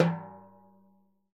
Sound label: TIMBHI CTR R, Synclavier Percussion; ILIO; Synclavier Percussion 2; World & Orchestral